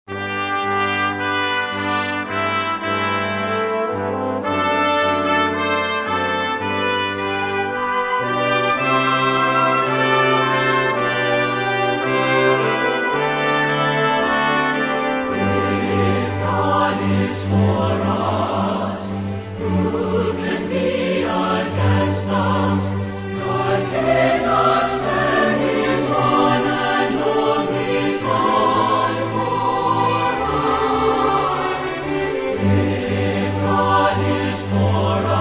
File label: Singers And Orchestra